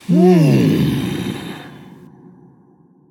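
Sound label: non verbal thinking, voice; cernunnos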